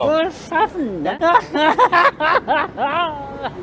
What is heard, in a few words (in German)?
frohes schaffen lache